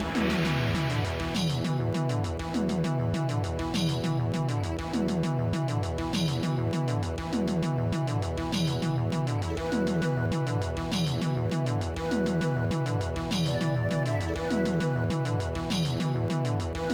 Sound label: Boss Loop